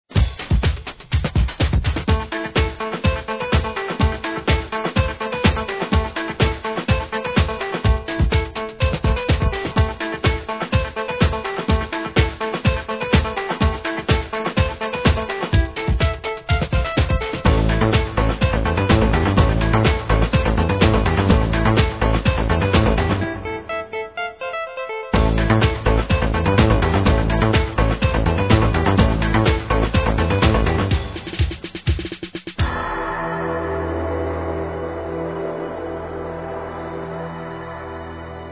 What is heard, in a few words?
dome, dungeon music